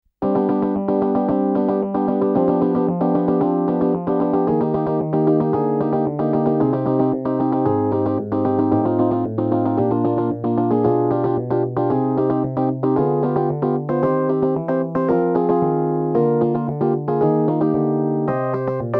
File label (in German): zwischenmusik